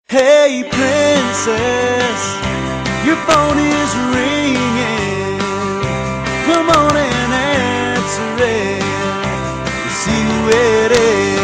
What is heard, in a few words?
Is Calling RNB